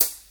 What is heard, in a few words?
crispy hat f sharp key, hi hat one shot tuned to the F# note. Loudest frequency: 11029Hz; hat samples